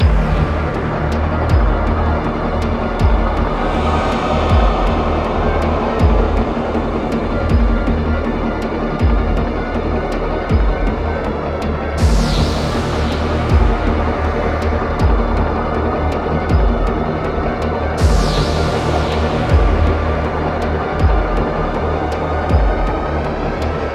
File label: Trapped Water; soma gamerip 2015